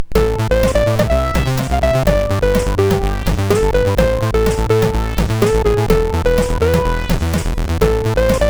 Intro, MOS6581R4

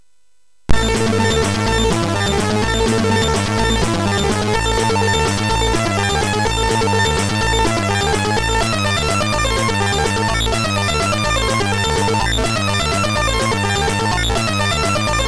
Music Demo Version, MOS6581R3; hvsc

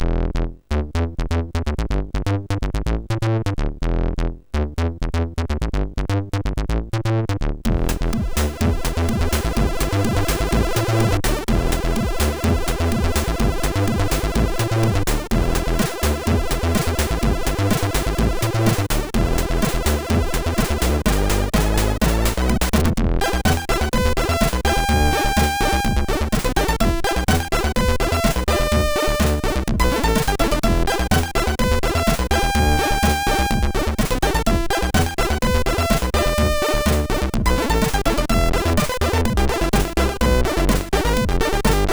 Jazzo, sid MOS6581R3

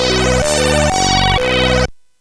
Jingles; hvsc

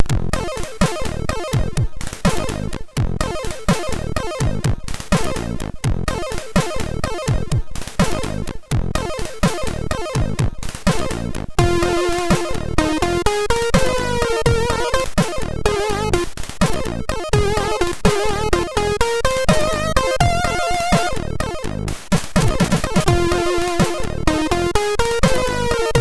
Jazz 2; MOS6581R2

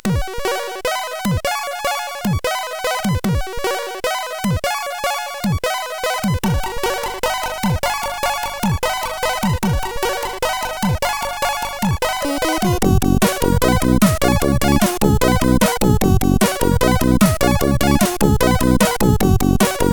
Labamba, sid CSG8580R5; hvsc

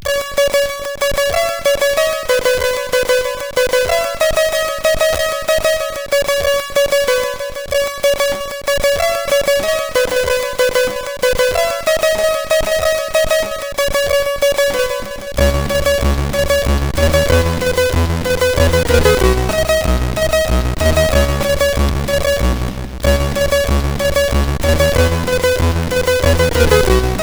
Piano Fun, CSG8580R5

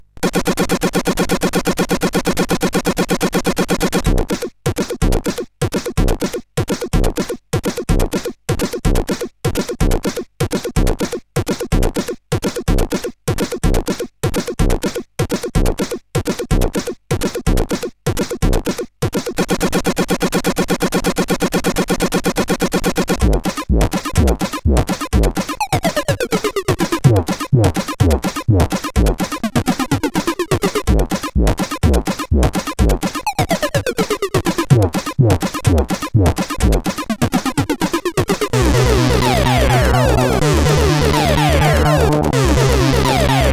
Bum Bottom Bassline, CSG8580R5

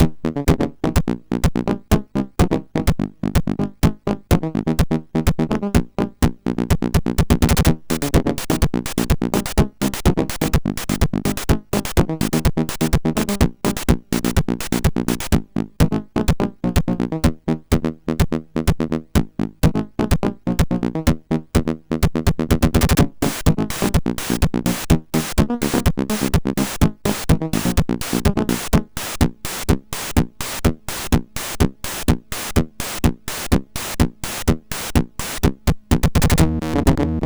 Techno Playtune, MOS6581R4

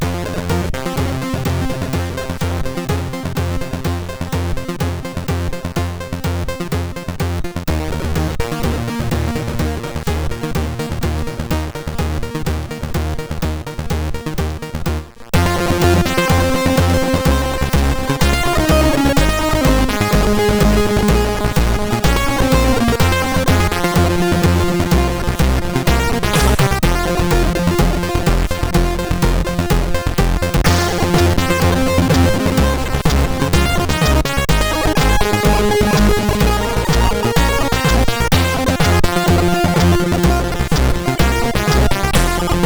Disco sid CSG8580R5

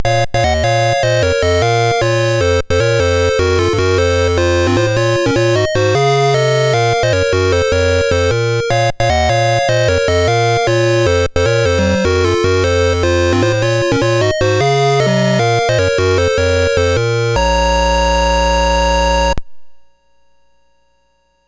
Dragonworld sid MOS6581R4

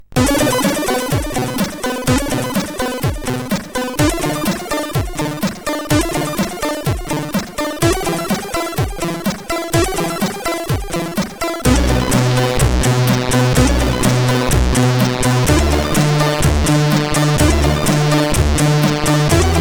Competition tune 4 sid CSG8580R5

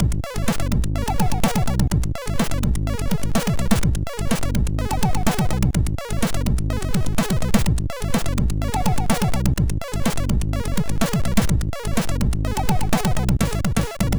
Party Music, sid MOS6581R4

